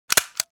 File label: Stapler stationery office push and pull